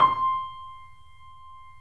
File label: C, 3-D Audio Collection, 3D Pianos, Yamaha C7